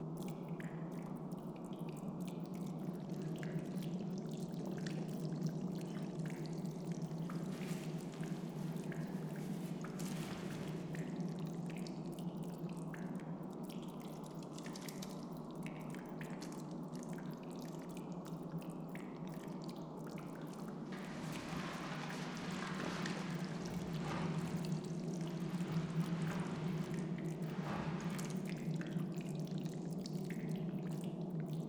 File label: atmos dust no chains